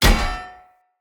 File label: Sphere Polearm Medium Impact Armour Solid; Weapon Impacts; Player; Combat